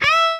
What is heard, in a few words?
jump; Cookie Run